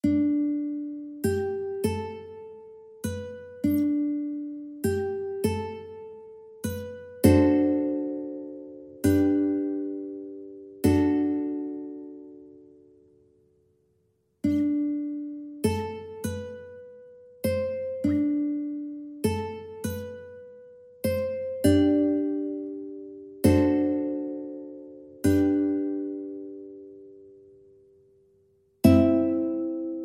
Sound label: ukulele